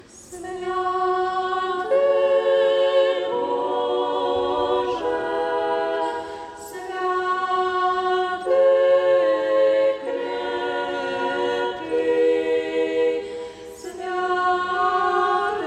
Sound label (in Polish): Trio Swiatyj Boze; chorow ze swieta parafialnego 2023